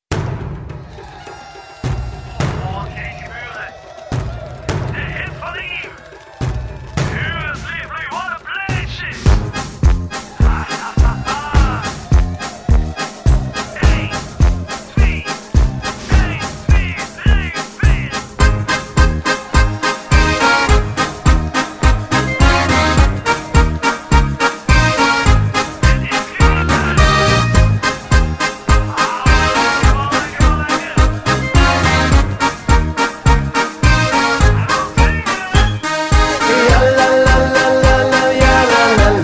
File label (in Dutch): Polka